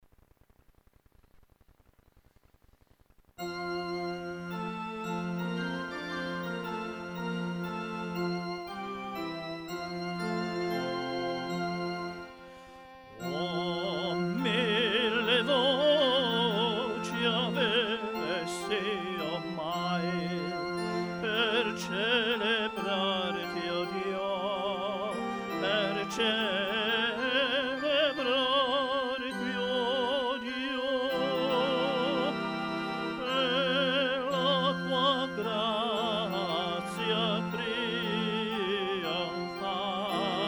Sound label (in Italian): inni